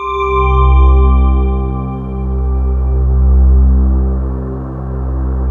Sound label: bellure C2